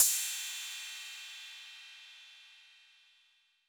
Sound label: Cym A 808 Sat Decay B, Cymbal, Multi Saturated